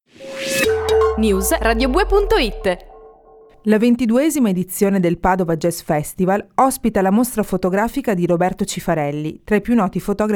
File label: jazz jingle